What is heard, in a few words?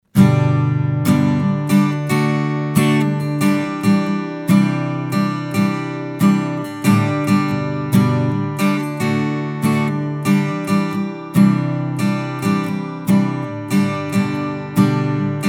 Acoustic Guitar Z5600a II; SE Electronics